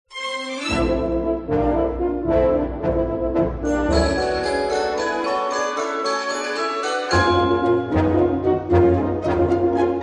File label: Book Montage alternate with trombones